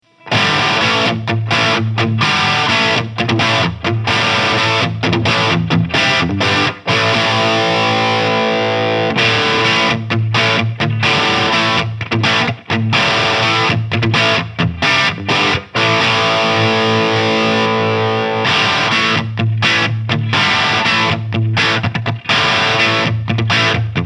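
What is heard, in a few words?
Epiphone Crunch, guitar; JC Bone White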